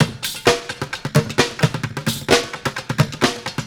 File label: Street Groove, Ambient Grooves